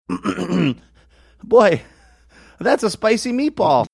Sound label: clearing his throat and saying boy thats a spicy meatball